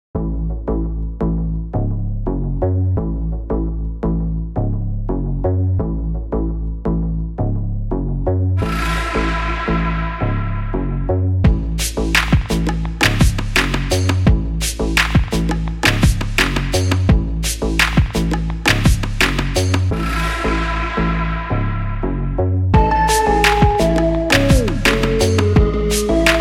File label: Insane bass